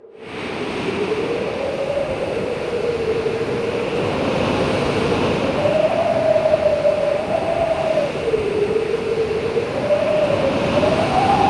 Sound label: Ending Ambience Loop; donkey kong country 2 fan remastered